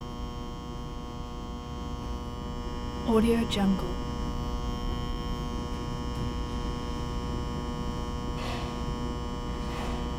transformer buzzing airy

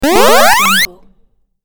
pixels throwback retro sound

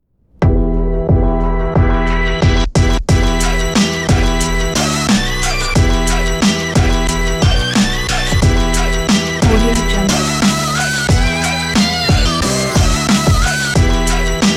urban hiphop; aspenmusic

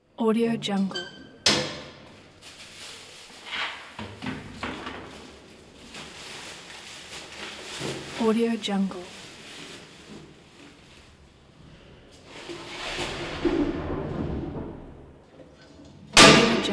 throwing out the trash

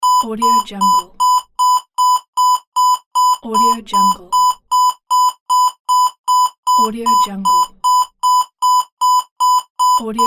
alarm

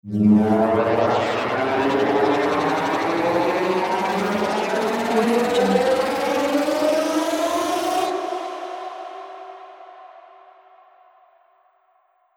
space engine acceleration

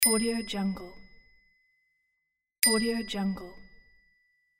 bell

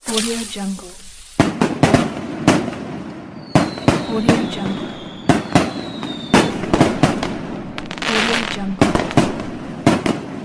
fireworks

